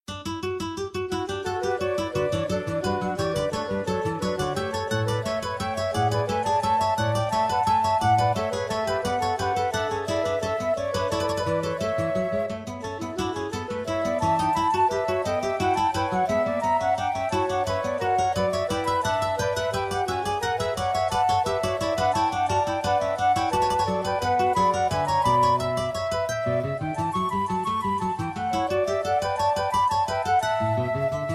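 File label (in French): inventions pour guitares flûtes; bach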